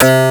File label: TECH C5, TECH BASS; Best Service Dream Experience